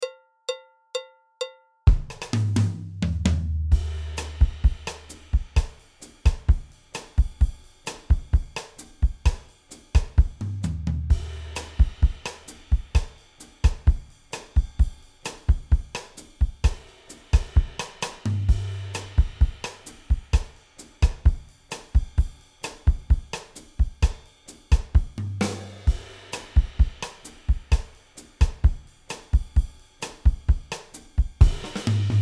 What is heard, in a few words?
bossa nova, drums